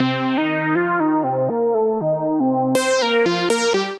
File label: Lead melody (Cm) 120bpm; Music Loops; Radio Lead melody; Ableton; Factory Packs; Singularities